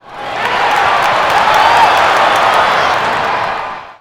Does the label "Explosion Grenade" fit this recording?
No